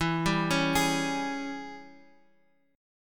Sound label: G sharp Major 7th Suspended 4th Sharp 5th, guitar